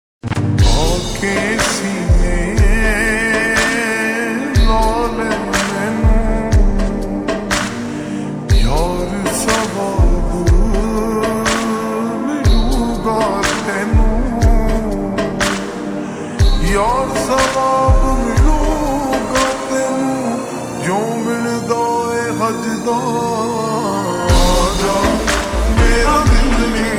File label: Ringtone slowed reverb